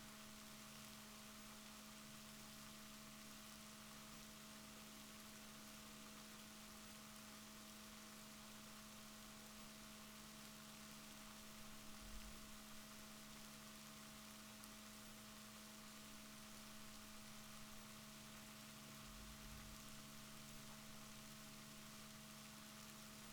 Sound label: rorquals positif, Balaenoptera Physalus Chile